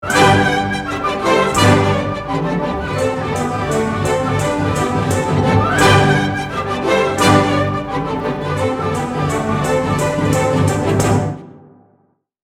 Trepak Fortissimo, Rhythmic accents on low strings, clarinets and bassoons, with the timpani hitting along with the rhythm.; Rule of Three; The Nutcracker